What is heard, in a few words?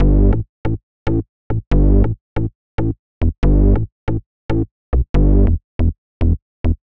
140 House Bass Amin; Digidesign; Pro Tools; Pro Tools Essential Loops; Dance DJ